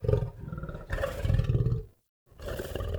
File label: Wolf Growl Relaxed Jog; Animals